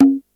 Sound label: conga, Yamaha RY-8